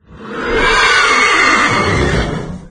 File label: Horse; Magic the Gathering Shandalar